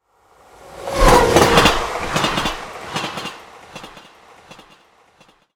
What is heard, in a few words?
train